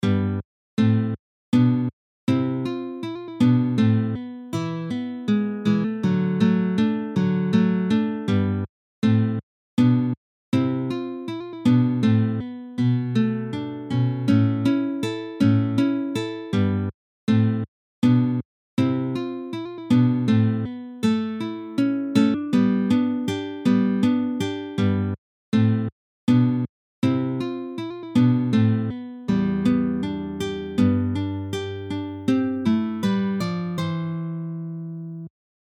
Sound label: Intro, guitar